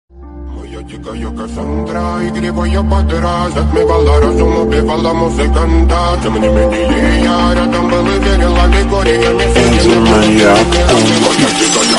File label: attitude ringtone remix